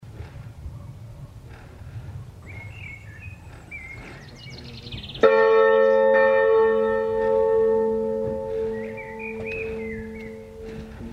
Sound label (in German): Glockengelaeut; Canon EOS 700